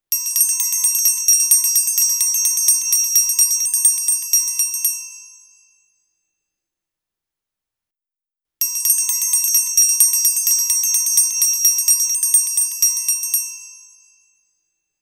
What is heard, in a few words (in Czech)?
Zvonek dlouhy; Studio Garage